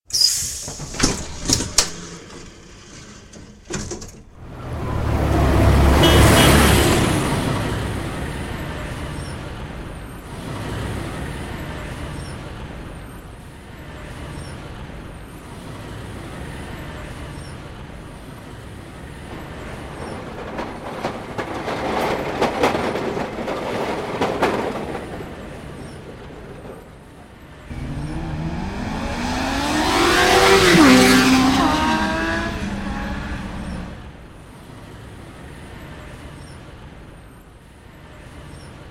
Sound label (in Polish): odglosy ulicy dzwieki